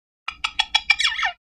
Macaw Clicks. Several Short Clicking Sounds Followed By A High-pitched Squeak. Close Perspective. Bird